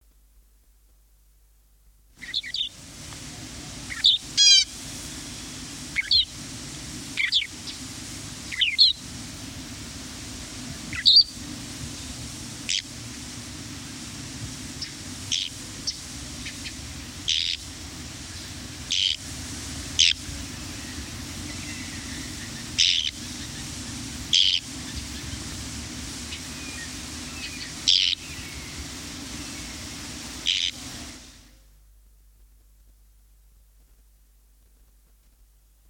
loggerhead shrike, songbirds

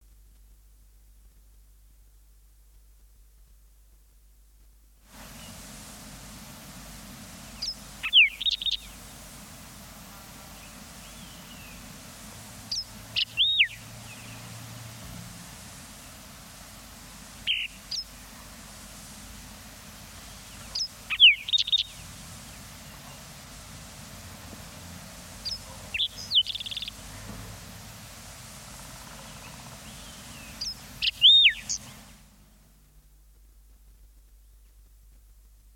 green tailed towhee, songbirds

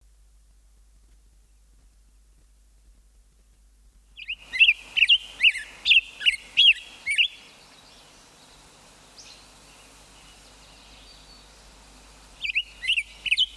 black headed grosbeak, songbirds

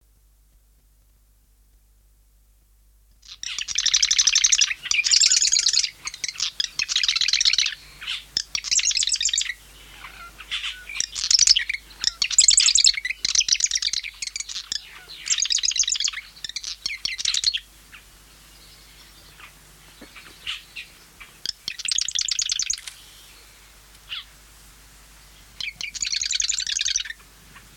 marsh wren, songbirds

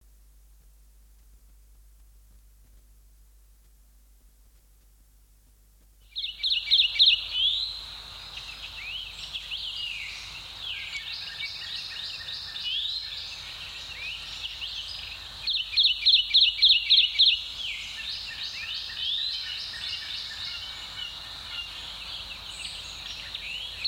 kentucky warbler, songbirds

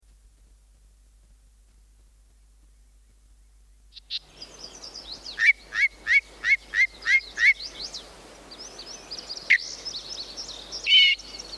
yellow breasted chat, songbirds

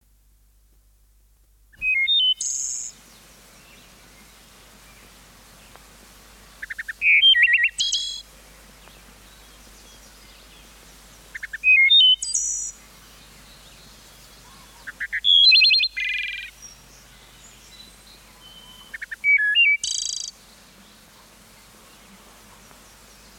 wood thrush, songbirds

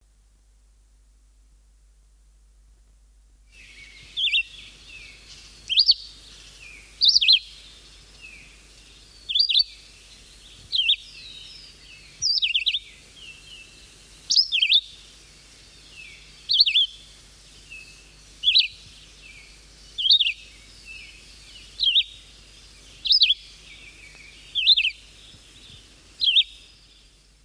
red eyed vireo, songbirds

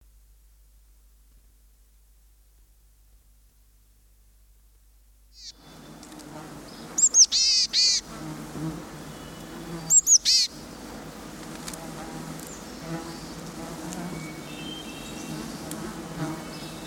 boreal chickadee, songbirds

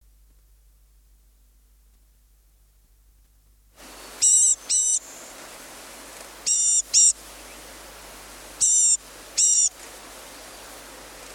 blue gray gnatcatcher, songbirds

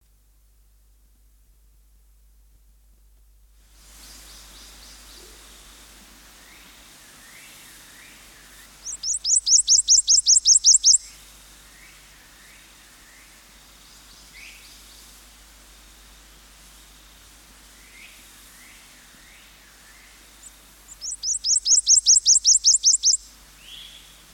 prothonotary warbler, songbirds

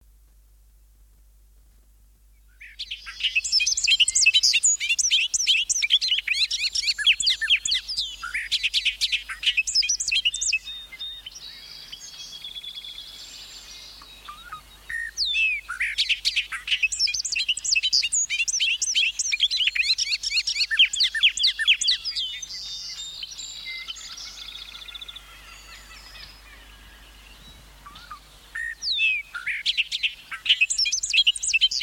bobolink

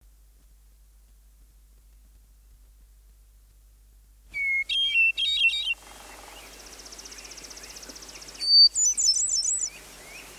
hermit thrush, songbirds

